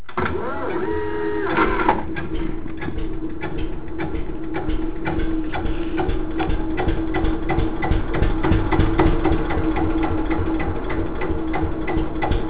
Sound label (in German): Eicher klang, traktor